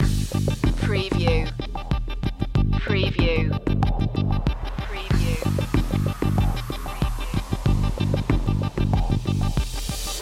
incoming transmission loop

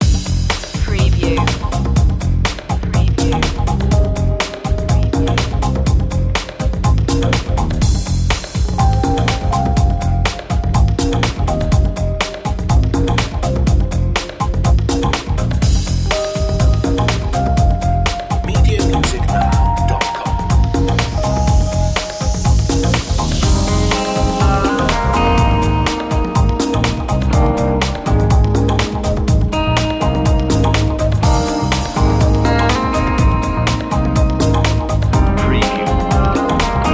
late brushes

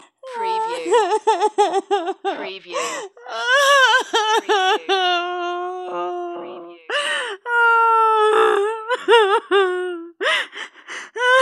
Female Sobbing Long

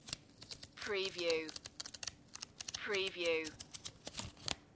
book flicking through pages

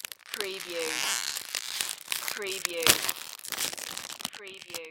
Bag Plastic Wrapper; Foley; sound effects bible

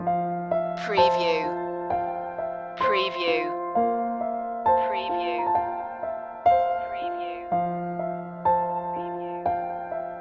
soft surrender loop

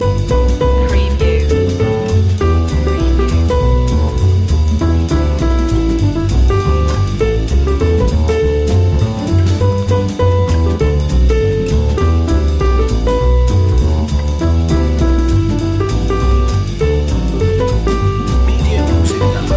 jolly old st nicholas jazz

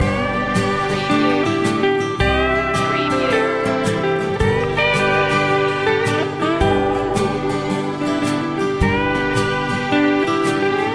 country walk loop

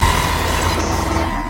Stinger; Sound Effects Bible

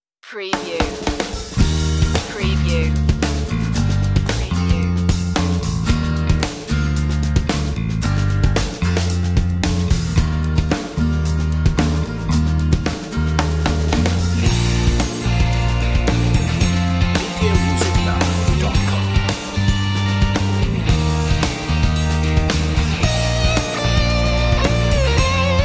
no piano

